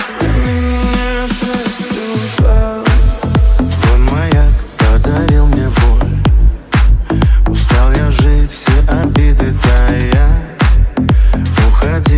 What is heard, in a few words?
ringtone